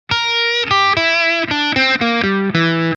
Gm 6 Pentatonik über C9

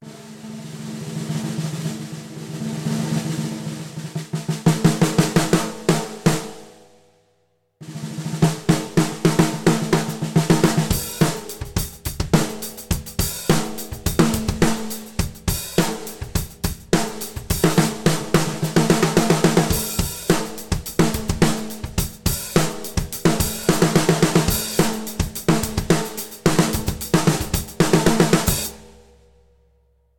Supralite Tief Offen

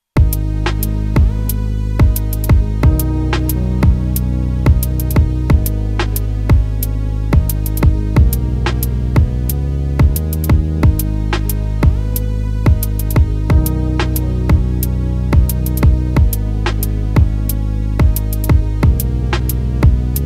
M8 Tracker Songbeispiel; Dirtywave

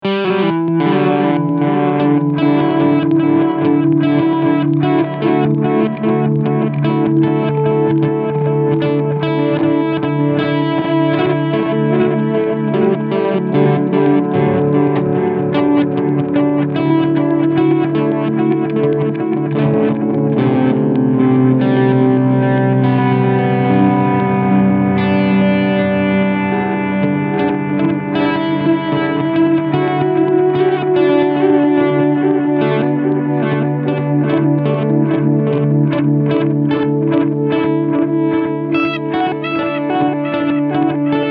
Pigtronix Echolution 3 Reverse Modus Mod OFF

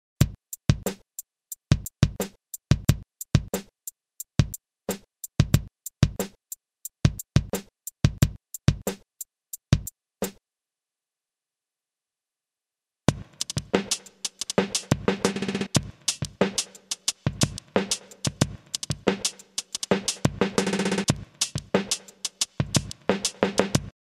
Roland SP 404Mk2 Drums vorher nachher

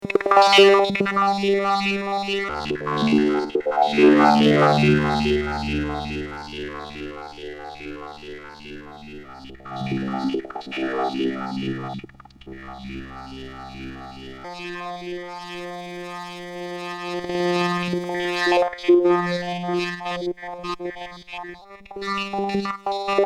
Murf und MS20

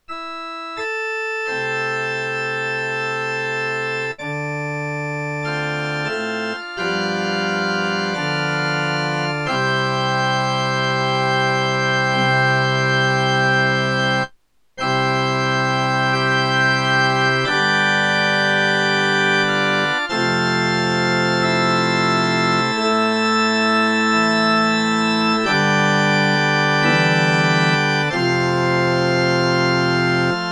Alesis Recital Pro Orgel

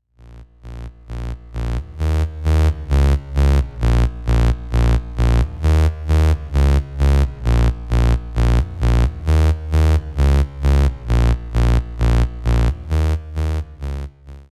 Saegezahn

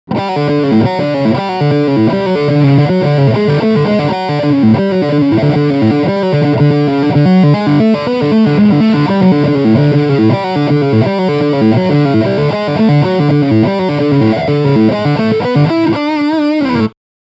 Riff Lydisch High Gain

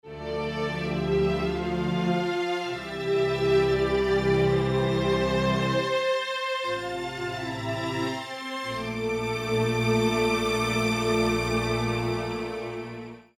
Nackt

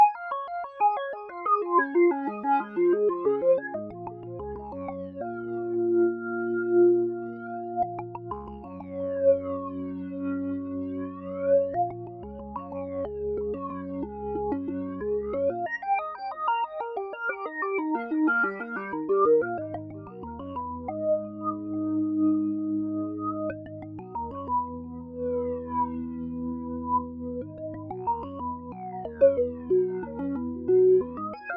Orgel Flanger

